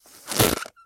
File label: party, whistles; magicworld